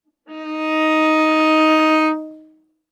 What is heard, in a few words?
Viola arco ff sul G Eb4; MIS Pitches - 2014; Strings